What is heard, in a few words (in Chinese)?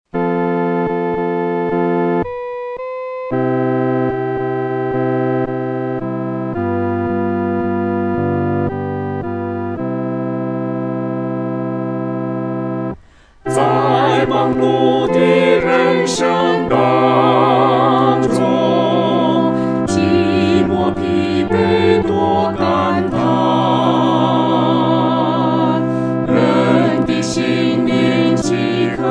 合唱 四声部; 微声盼望